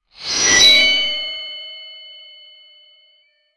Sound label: the katana unsheathes wit; melee